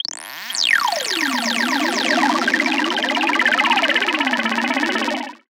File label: Burbles, transition samples